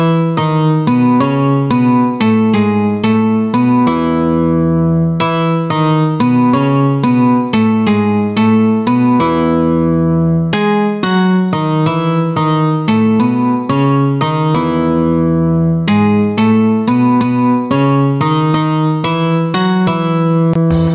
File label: Redmi Note 9 Xiaomi Stroll Ringtone